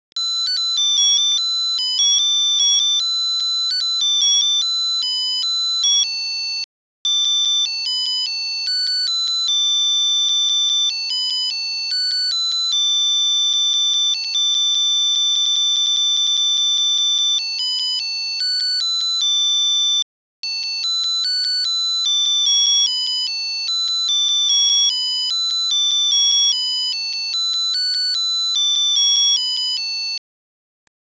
London bridge old mcdonald twinkle twinkle, Melody song